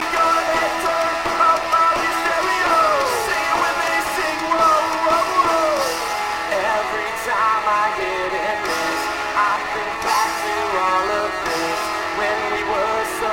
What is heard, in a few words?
Suspense